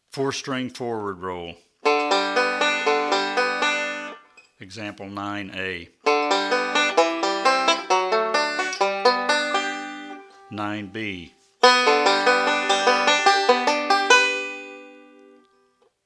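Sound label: Four-String Forward Roll, banjo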